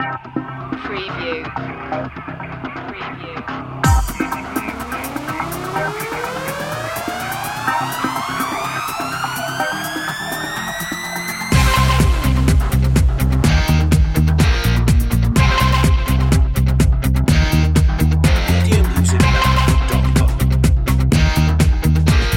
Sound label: crazy ride with sound fx; race